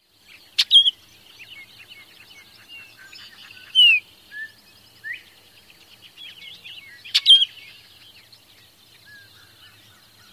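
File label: orchard oriole other calls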